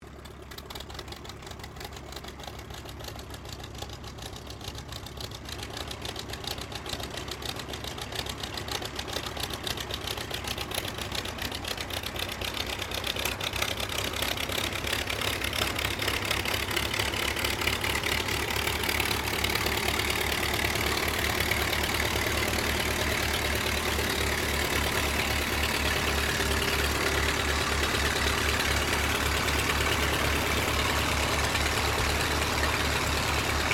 AIRCRAFT DEHAVILAND DHA-3 DROVER STARTUP, tri engine; Airplanes (Sound Effects:Vehicles)